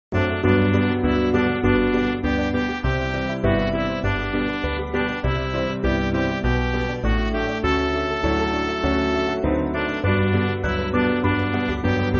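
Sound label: Midi, Backing Files for Churches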